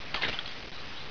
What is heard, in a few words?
debris glass; valve